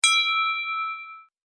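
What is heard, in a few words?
sms received, iphone os sounds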